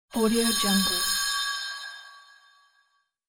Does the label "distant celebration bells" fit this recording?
Yes